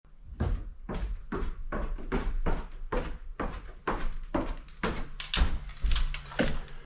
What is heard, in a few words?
footsteps coming down old wooden stairs